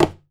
cajon; Voice Crystal - Steve Reid Definitive Percussion

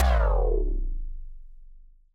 TD-20 Tom B, Roland TD-20 Toms

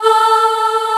DIDI, SYNTHESIZER, DIGI WAVE

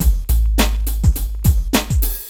PHATT BEA, Hip Hop

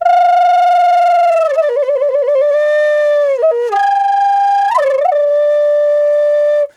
G WILD, FLUTE WILD; Big Fish Audio - Didgeridoo and Other Primitive Instruments

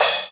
MUTE CRASH; Greytsounds VOL-1

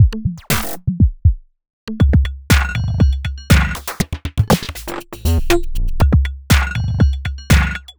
Robot Thunder, Modular Electronica

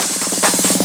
Fills, Loops 140 BPM

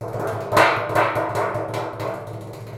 PRC PAN, Long Perc; Rhythm Section; FX Perc

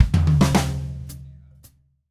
Red Maple Groove 110, DW Red Maple Kit

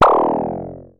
Synare Filter; Star Instruments Synare 3

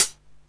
Hat C, Alesis Hr16, Drums

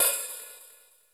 SY77 HH OP; Crypton - DrumBase5000; SY77 DRUMS

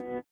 SITAR; Spectrasonics; Metamorphosis; INDIATEK

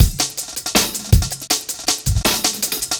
beat (busy on top); Sonic Foundry (Sony Creative Software); Ugly Remnants VOL-2; Knutrix Demo

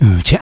vox, Human Perc; Spectrasonics; Supreme Beats; World Dance

